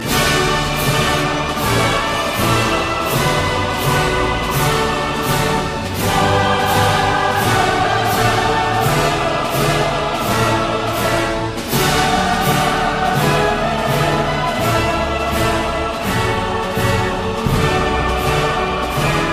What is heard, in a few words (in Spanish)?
ORGULLO Marcha; Pompa y circunstancia; Edward Elgar